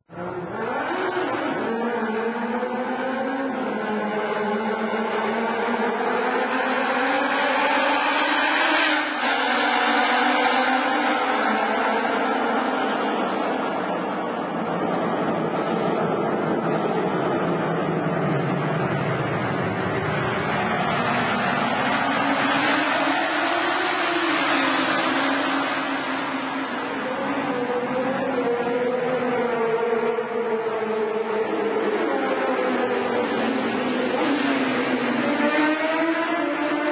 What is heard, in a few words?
tunnel, motos; Aaaahhh !!! les motos dans les tunnels; GSX-R